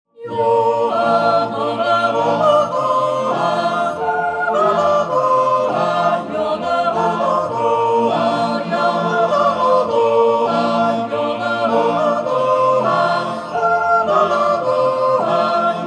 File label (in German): guehnhorn jutz